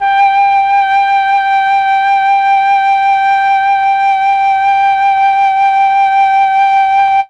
flute; MEL.FLUTE

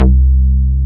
Synth Bass, Roland

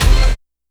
DANCE STAB; E-MU Formula 4000 Series Vol. 2; Dance Hits

